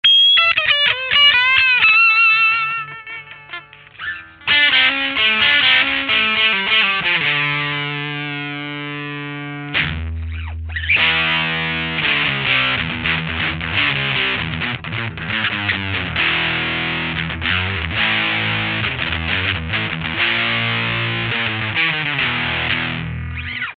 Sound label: jcm800